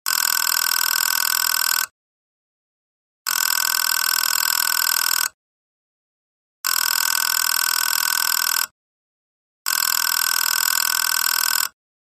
Old phone call; telephone

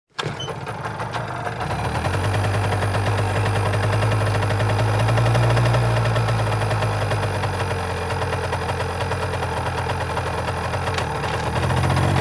Motorcycle starts, waits, drives away

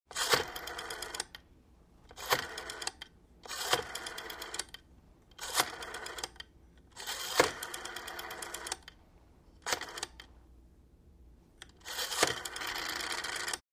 Dial a number on a rotary telephone

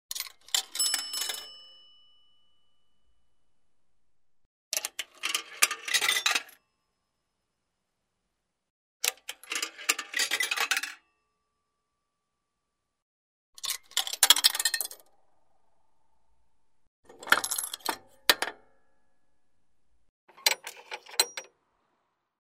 Tossing a coin into a pay phone; telephone

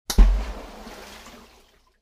Stone falls into the water

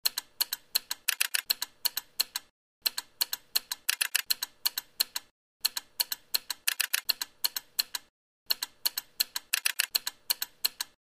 Morse code SOS; radio signals

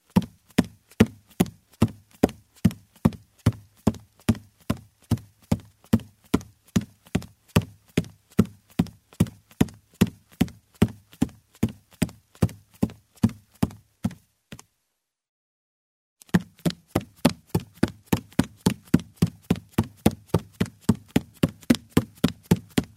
Running barefoot on a wooden floor; steps

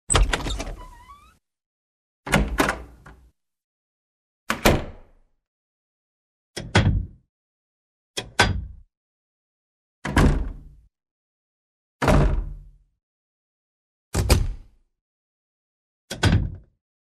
Wooden door opens, closes

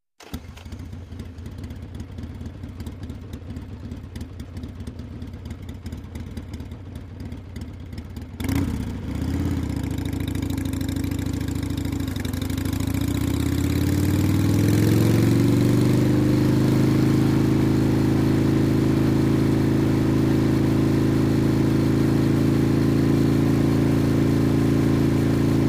Motorcycle starts, moves, turns off

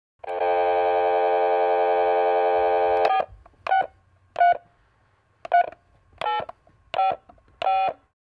Tone dialing sounds on the handset; telephone

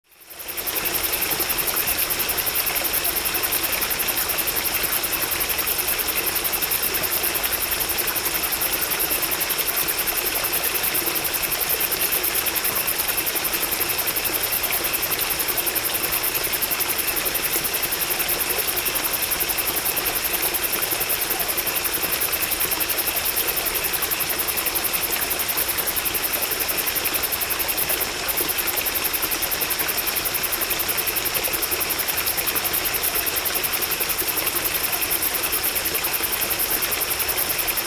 Nature, night, crickets, flowing river

